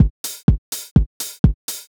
HOUSE BEATS 125

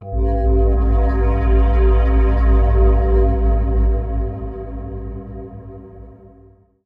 TECHNO PAD 140; Keys

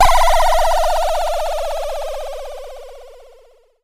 ELEC FX; JUST FOR FX

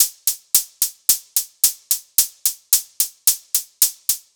110 closed hat simple, Percussion; Loops; Touch Loops; CosmicFunk

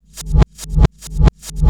DRUMLOOP TEKNO 140; Techno2

